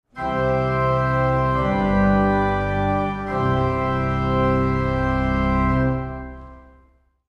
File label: Mezzoforte